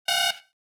Futuristic alarm imminent threat or warning single alert